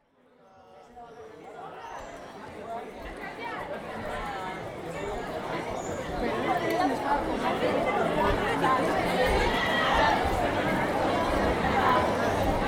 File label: Leon Street Scene